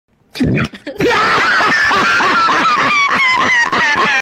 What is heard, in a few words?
hilarious laugh